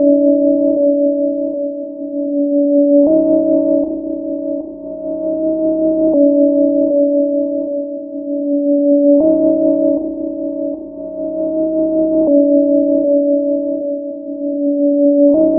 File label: tone